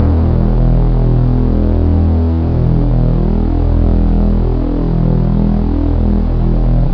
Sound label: dojo thril